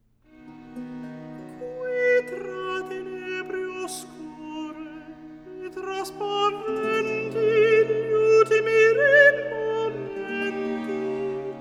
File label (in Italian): Recitativo; Ombre tacite e sole; Qui, tra tenebre oscure; Scarlatti; Cantates